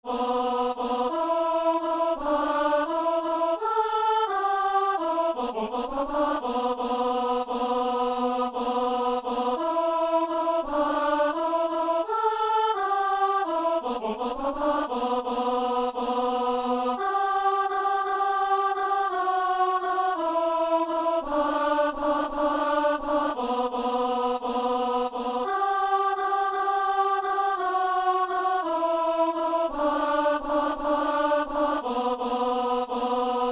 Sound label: alto; Tourdion; Chorale liturgique